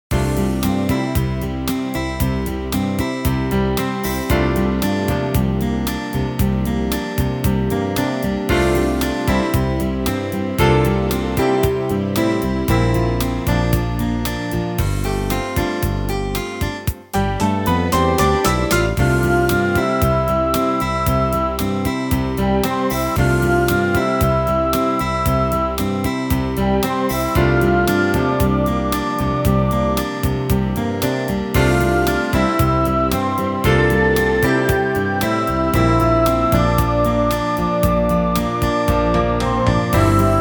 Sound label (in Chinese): 纪录片背景音乐; 3分以内